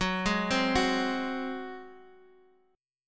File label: F sharp Suspended 2nd Flat 5th, Guitar, DADGAD; chordshape; down